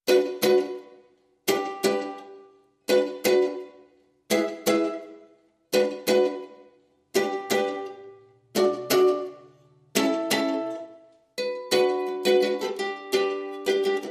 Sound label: Ukulele Mandoline Vibraphone